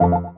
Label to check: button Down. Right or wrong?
Right